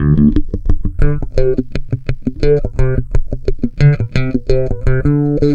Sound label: Bassline Funk